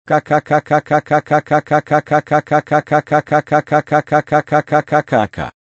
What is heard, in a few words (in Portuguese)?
risada kkkkkkk risos